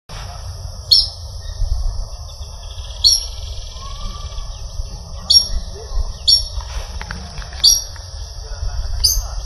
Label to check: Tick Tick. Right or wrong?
Wrong